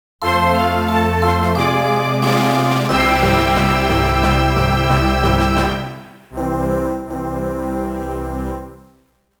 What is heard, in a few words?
Victory Call; culdcept ds original soundtrack deluxe 2008